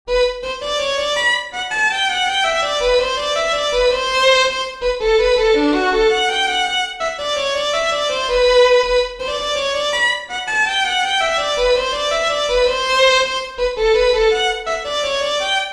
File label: DONEGAL JIG, fiddlers; peifiddlers